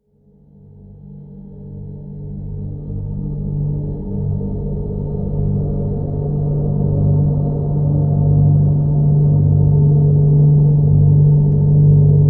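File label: drone G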